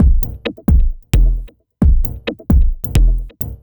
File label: Complete Loop, Dance Beats